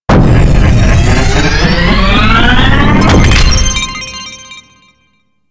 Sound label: Dojo Build Complete, UI; Warframe